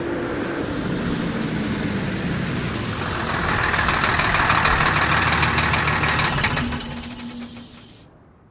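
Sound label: Truck Diesel In Stop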